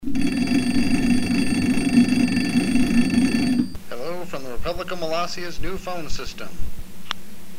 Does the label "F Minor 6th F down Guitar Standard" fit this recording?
No